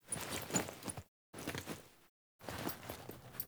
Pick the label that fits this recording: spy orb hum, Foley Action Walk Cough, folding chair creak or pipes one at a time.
Foley Action Walk Cough